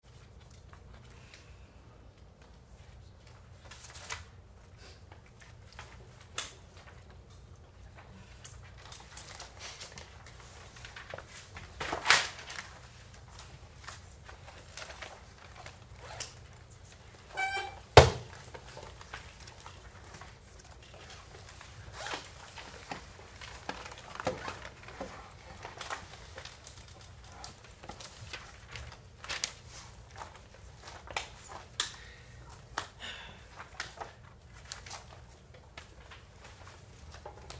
Activity Class Quiet Study; Schools

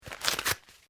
Cardboard tear; Material components for constructing more complex sounds

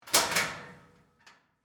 Locker Open, Sports, Locker, Door, Open; Locker, Room; Miscellaneous Sport Sound Effects; BlastWave

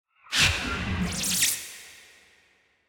Hit Warble Rear To Front; Blastwave

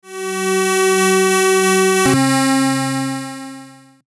Logo Accordion Glitch Swell; Sonic Logo; Blastwave; Sonic Logos; Production Element Imaging Element Accent Transition

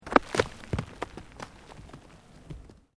ROCK TUMBLING; Material components for constructing more complex sounds; Rocks; Materials

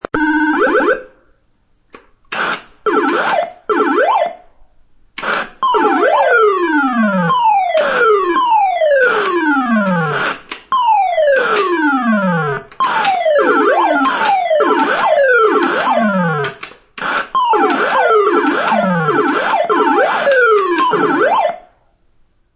Playing Galaxia video game.; Games

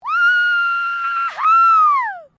FEMALE SCREAM WOO HOO; Female vocal sounds; Screaming; Voice

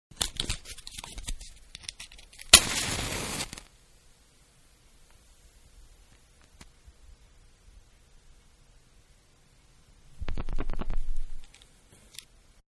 Match Paper Strike And Burn; Flames (Sound Effects:Objects)

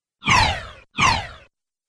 Whoosh Fast Strong Twice

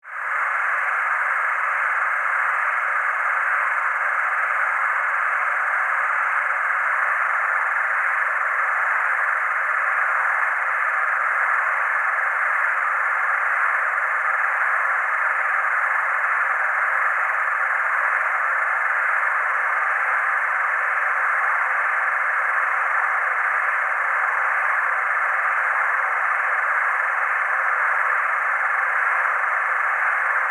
Scifi, Alien, Locust, Swarm, Science, Fiction; Aliens

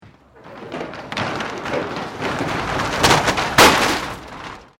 Door Roller Aluminium Garage Lower; Various doors; Miscellaneous Doors; Sound Effects:Doors

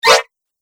Arcade Game Magical Swipe Sound; Video Game Sound Effects; Retro Inspired Sound Effects - Think Nintendo, Atari, C64; Andrew Fleming

